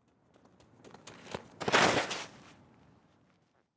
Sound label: running slide into base